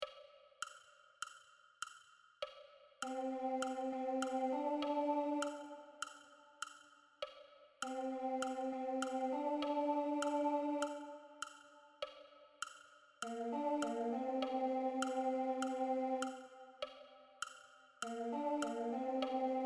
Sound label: Alto, Gospel